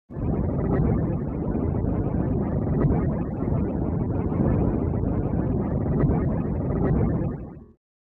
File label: Bubbling Rising Synt